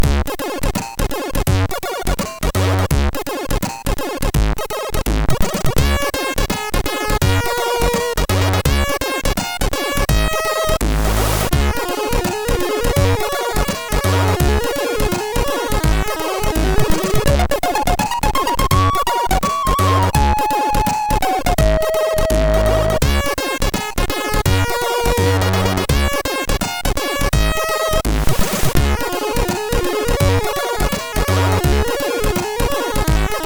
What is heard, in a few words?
Timp Groove, MOS6581R3